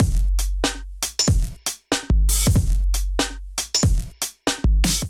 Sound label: Kit 94 bpm, Drums; MIDI Clips; Allee Gate; Ableton; Factory Packs; Chop and Swing